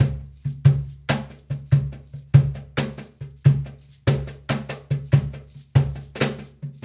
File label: Cajun, Percussion, Drums; Essential Percussion